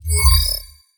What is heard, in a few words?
your turn circle